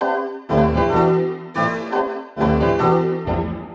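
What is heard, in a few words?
piano ambient loop; Melody Loops; Crowdsourced Sample Pack; TRUTH-HZ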